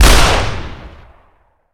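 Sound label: Weapon fire hunting revolver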